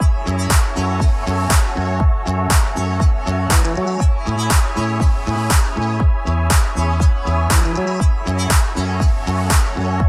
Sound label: rock